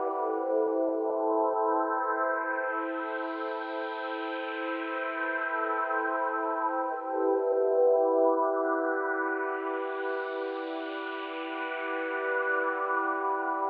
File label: techno pad 140; Techno2; Keys